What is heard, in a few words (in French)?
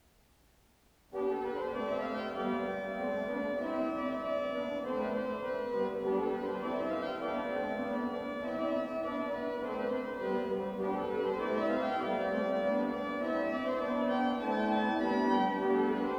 improvisation musicale